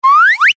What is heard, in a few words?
cartoon slide whistle ascend climb very fast